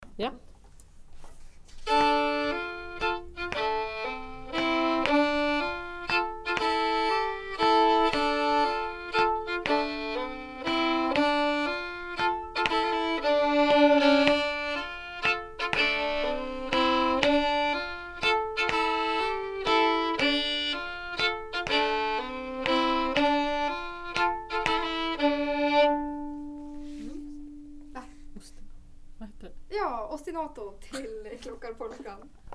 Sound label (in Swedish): Ostinato klockarpolskan